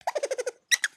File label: Pets Skunk Happy; creatures pets; Lego